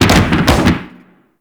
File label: Slide Boost Backfire, Motorcycle; Warframe; Vehicles; Motorcycle Ninety Nine